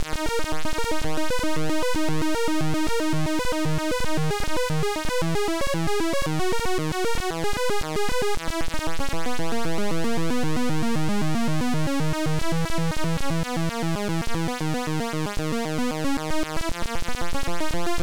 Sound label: Title Beeper; Steve Turner; Quazatron